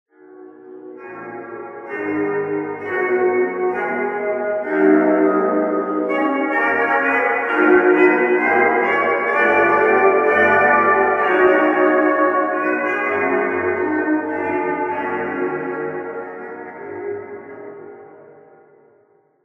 Jazz; Jazz Interiortwo

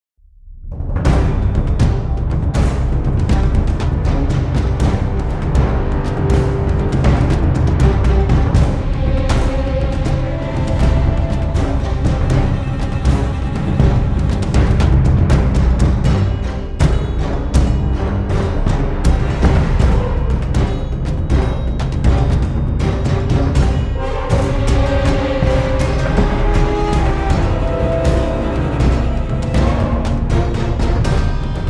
Loop Fight

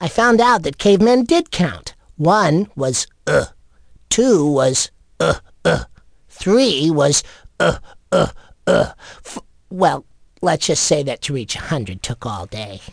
Interface

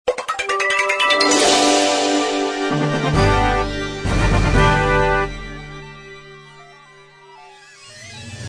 Jingle; Laser-Washing Powder ~LockJaw~ (Long)

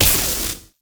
Explosion Lightning; Infernal Radiation

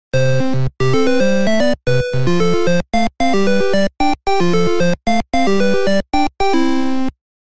Bonus Win; Alleyway

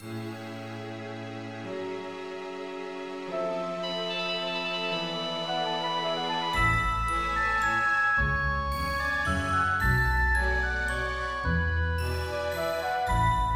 Waltz; animetic story game 1 card captor sakura ps1 gamerip 1999

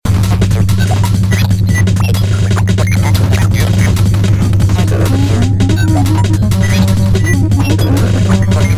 Glitch; Aphex Queen; puss soundtrack

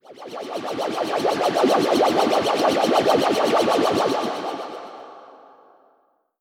Rotate; Pac-Man Championship Edition 2 Plus

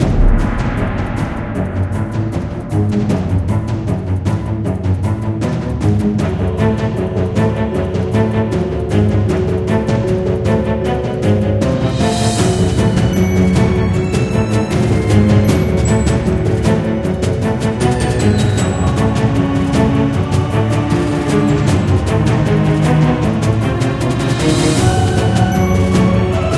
Music Dungeon; Tapventures; Android

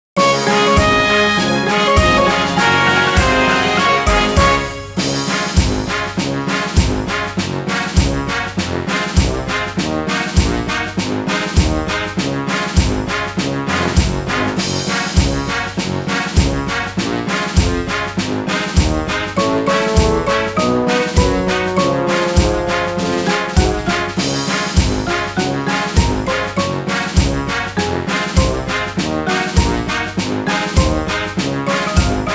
Karaoke; Monster Hunter

